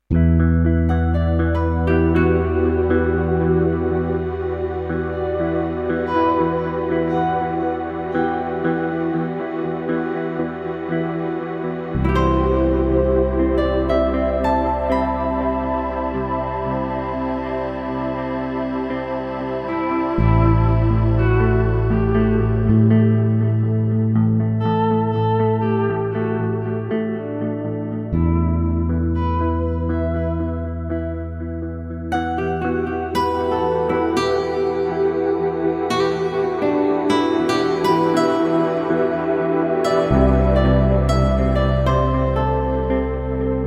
Twin Gtr Arps

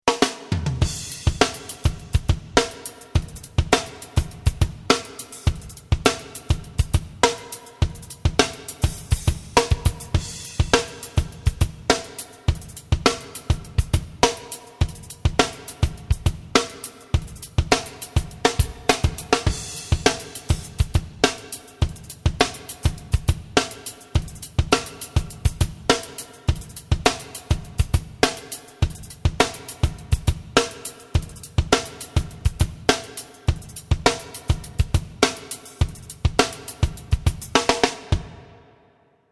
Reverb

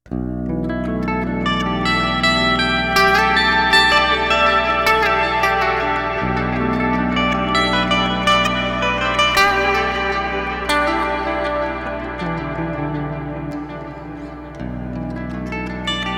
LTD Baritone Arctic Metal Ambient Crystal Clean

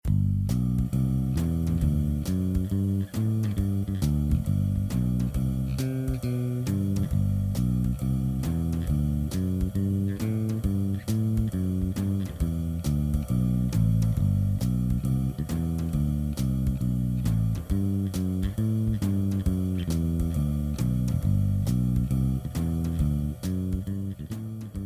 walking bass

